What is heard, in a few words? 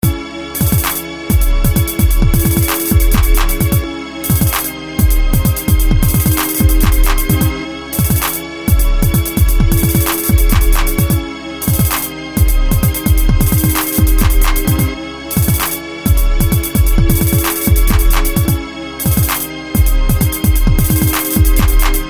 beat in progress